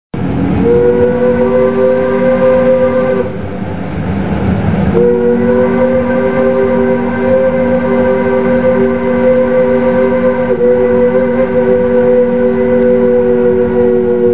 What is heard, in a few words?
train whistle